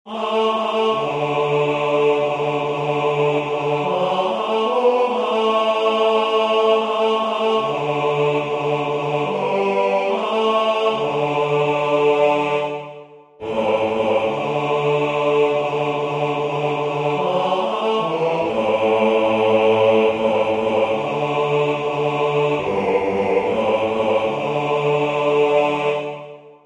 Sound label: bass